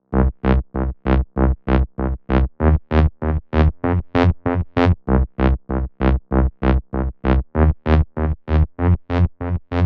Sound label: TEK BASS